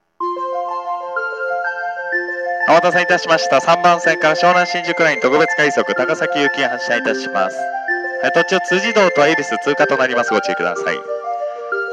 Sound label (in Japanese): 発車メロディー, 下りホームはスイッチが駅員扱いのため、駅員放送が非常に被りやすいです。; 東海道線; このホームが一番2コーラス目に入りにくいです。; 小田原・熱海・伊東・沼津方面; たなばたさま